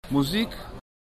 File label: loop